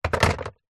household telephone landline plastic unit set down table